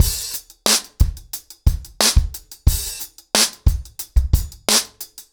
ACOUSTIC DRUMS, 90 BPM; The Roots Drumloops; Locked Out

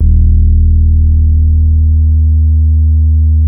THE DEEP D#3, Boom da Bass; E-MU Producer Series Vol. 6 – Analogia Project

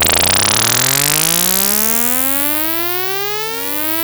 Moog C#4, rare synthesizer; Hollywood Edge; Giorgio Moroder Rare Synthesizer Collection

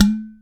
OPEN TOP, UDU; AKAI S-Series CD-ROM Sound Library VOL-5

